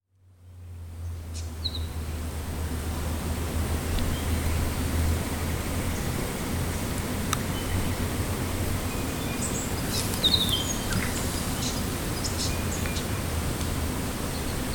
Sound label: Blue Mountain Forest